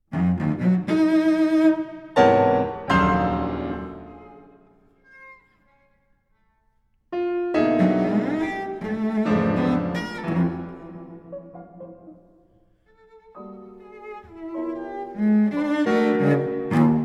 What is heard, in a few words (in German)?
cello sonate sehr bewegt; 1914